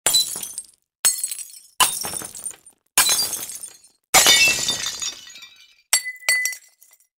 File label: Glass Breaking Noise